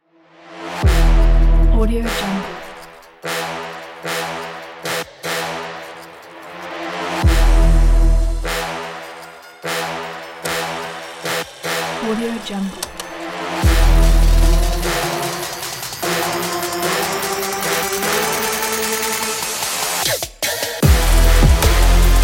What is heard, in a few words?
sport trap racing